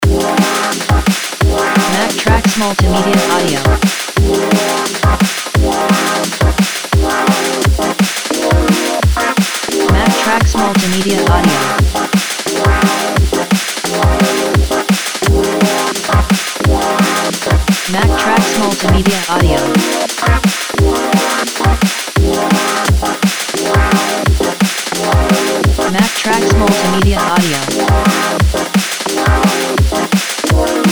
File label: drum impulse